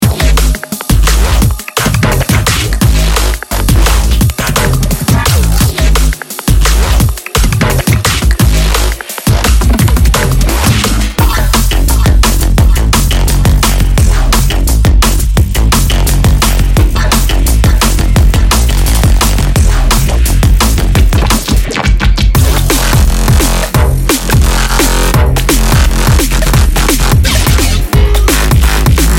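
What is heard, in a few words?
complex drum and bass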